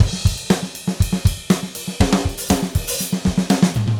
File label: Gretsch groove 120 BPM, 1967 Gretsch Kit drums